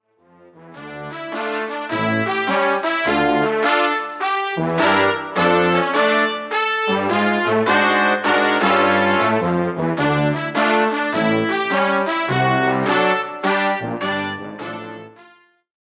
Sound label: brass